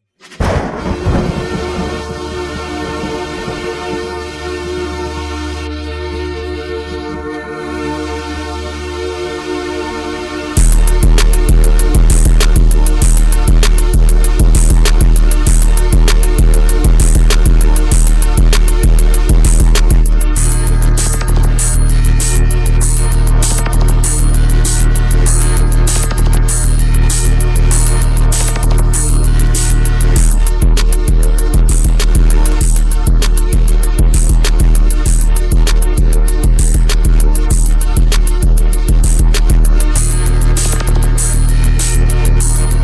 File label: Distorted Instrumental